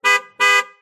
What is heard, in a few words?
Honk